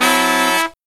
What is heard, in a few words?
low chord, brass riffs